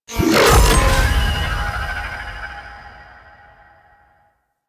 Artifact Complete; Missions; Sentient Defend

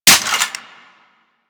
Sicarus Mech 2D, weapons; DE; Warframe

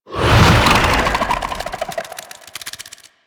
Scarab Swarm Cast; Warframe; Warframes; Mummy

